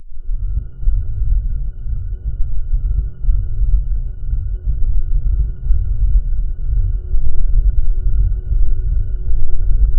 ethereal hum mystic; alluring; SFX Engine

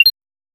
quick blip ui sound

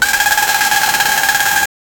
racing car videogame coin

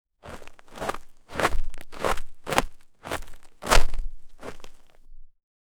step onto gravel, crunching

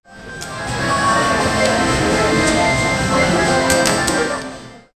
casino ambience like bein; slots

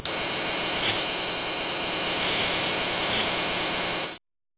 a scout drones optical ca, drone

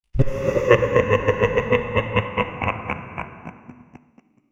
a monstrous layered demon; glitching

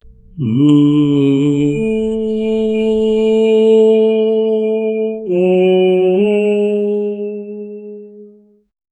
haunting off key hymns

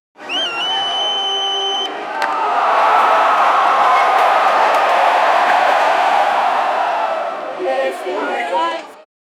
soccer videogame start cr

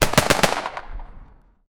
cannon shooting one round

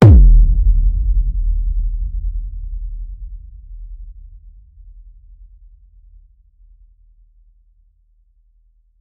kick sound heavy thump wi; sound effect; body impact

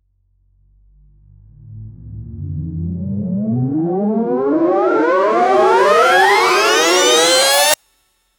sequence rising tones c5 e5; energy build up

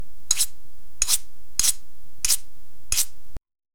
brush strokes

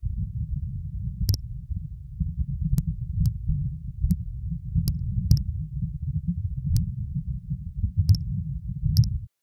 catching a shark, fishing

